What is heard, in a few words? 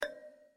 tick